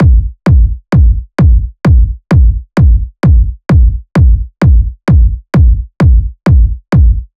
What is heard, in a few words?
Kick Loop 130, Techno